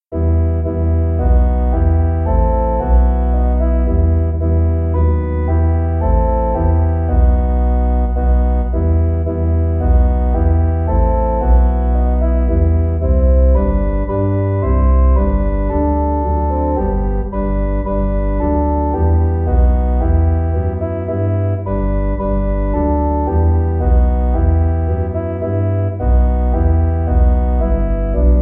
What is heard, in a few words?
Fughetta